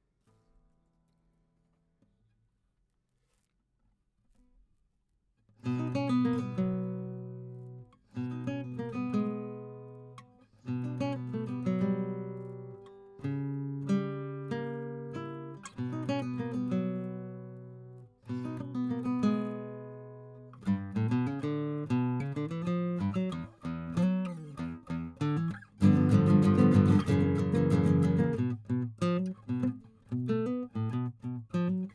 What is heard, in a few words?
GTR